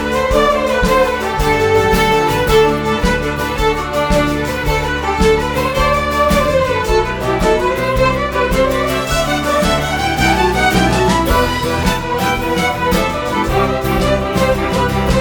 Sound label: Jig